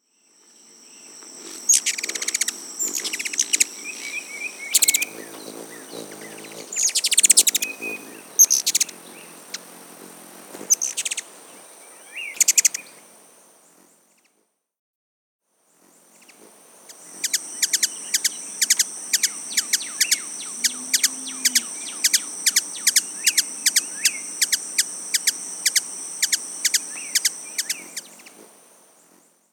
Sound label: Ruby-throated Hummingbird Calls